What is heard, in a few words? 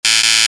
Buzz In; zoomerang